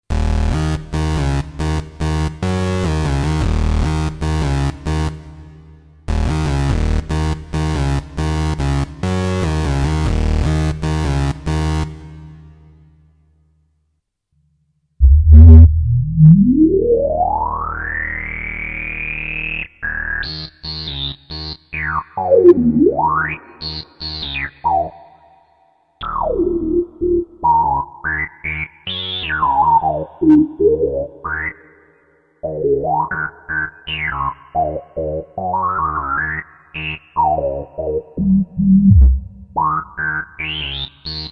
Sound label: resonanz filter, ensoniq